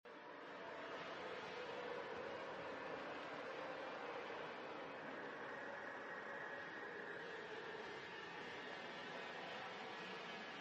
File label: fans sound